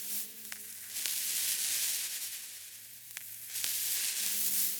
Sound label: Cracklature, sparse soundscape